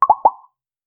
cute dating app notificat; apps

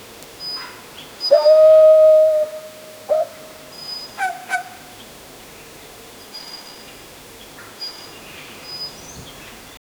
rabbit in the jungle, sparrow

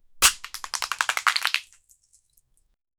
pram dismantling starting; loose

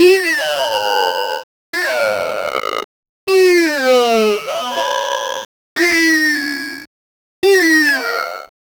illuminati members gather

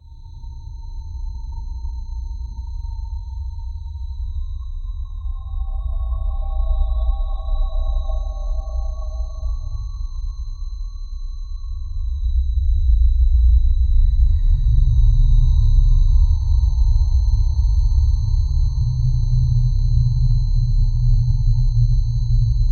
a slow eerie dark ambient

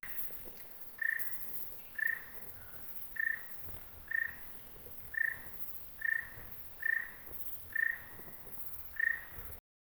reptiles

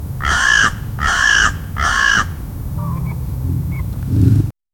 cow grazing dramatic, livestock

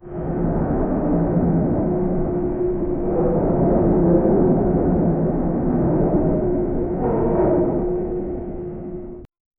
interior ambience of an a, abandoned

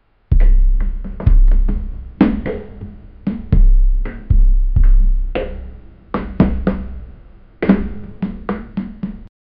tribal drums slow sound

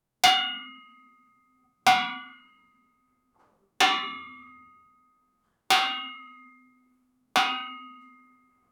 sound of a man tapping, veena

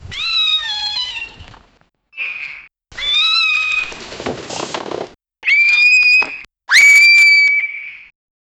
the cat lets out a, battle cry

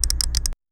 pointer hovering over but, computer

